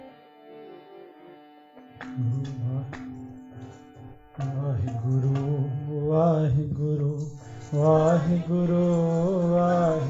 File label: gun gaavaa dhin rain, Kirtan; Weekly Sangat Online Kirtan